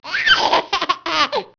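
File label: baby laugh; Microsoft Graphics Studio Greetings; Microsoft 1998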